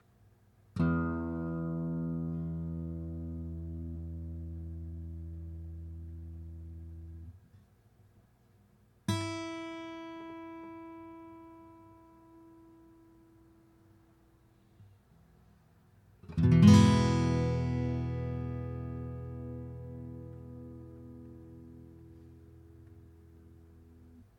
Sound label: nama guitar